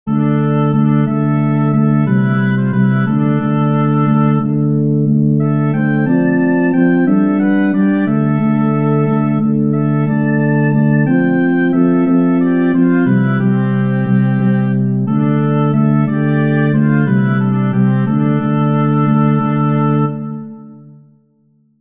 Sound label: scarborough fair trio